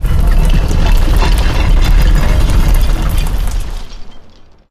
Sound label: awakening debris; Source Engine; Portal 2